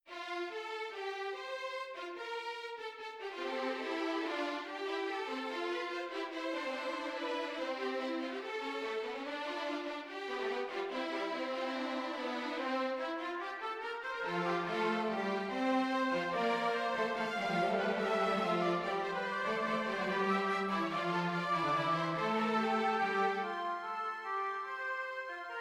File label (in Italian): Orchestrazione; Ronge; Gerd Prengel